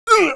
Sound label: damage, pain; mohaa; dialogue